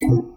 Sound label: ui click pause; Stellaris